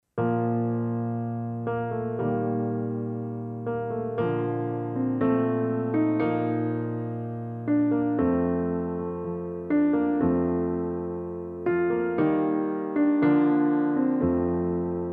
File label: Soprano sax